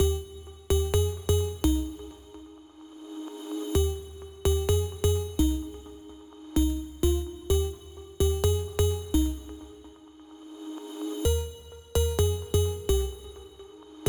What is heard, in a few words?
128 BPM C Min Chords; Loop Stems; Cymatics; Feelings; Breakdown Loops